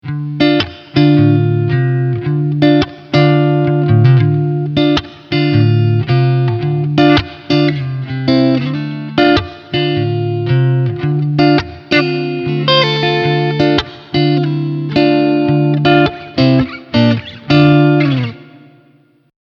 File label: Front PU Singlecoil